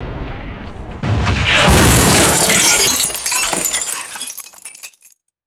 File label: car crash with breaking glass and metal debris; destruction